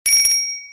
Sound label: samsung